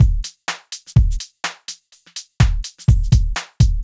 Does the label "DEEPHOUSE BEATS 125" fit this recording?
Yes